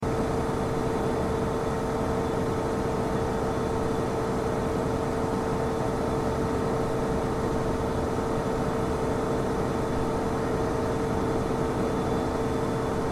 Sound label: printer vent; nyxteam printer